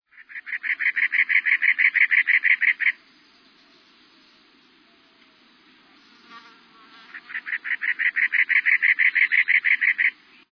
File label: Cactus Wren